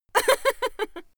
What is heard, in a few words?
female laugh